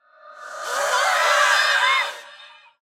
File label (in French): Banshee Cri; Phasmophobia